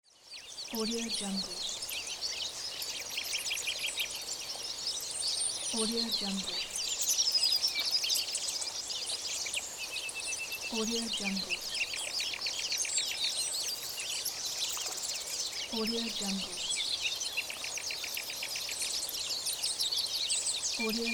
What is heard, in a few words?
nature sound; lfo sound